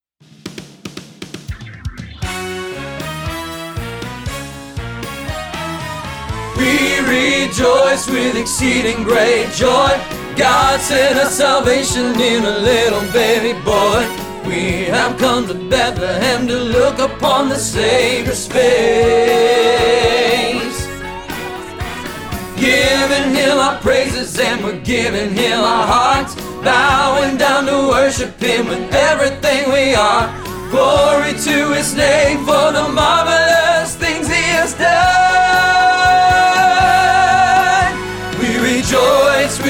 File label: reprise tenor rehearsal track